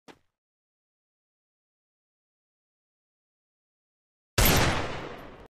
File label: Desert Eagle; اسلحه دزرت ایگل